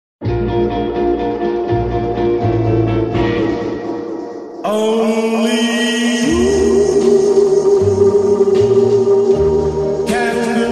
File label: echo versiyon; Şarkıyı düzenledim echo efekti verip zil sesi yaptım.